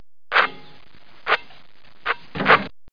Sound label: swish